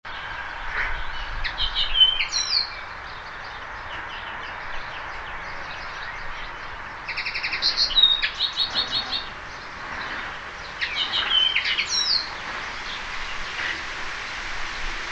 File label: Carduelis cannabina